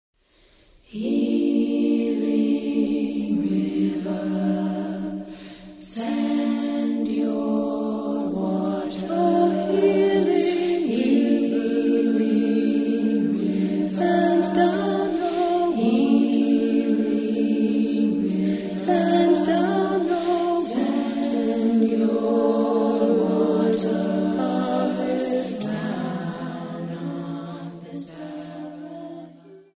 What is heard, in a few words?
healing river